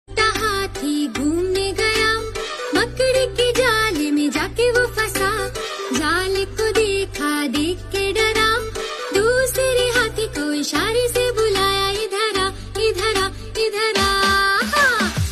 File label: Ringtone